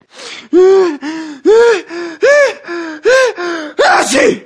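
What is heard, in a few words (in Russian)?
вариант чихания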